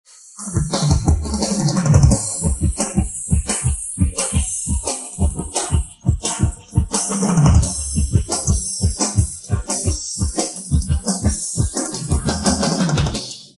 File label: drums; spleeter